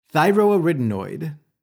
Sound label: Thyroarytenoid, hypohyperphonation